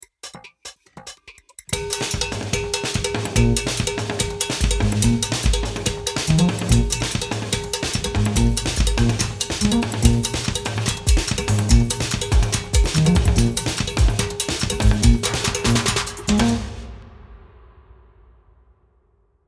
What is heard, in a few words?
Mambo